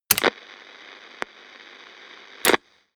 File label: promo pad